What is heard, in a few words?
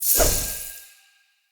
Loot Drop Generic